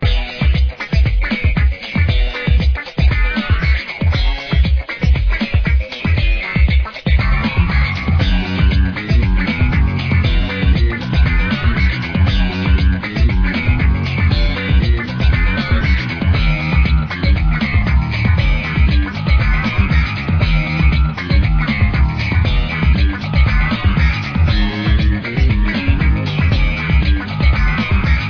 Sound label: Country Funk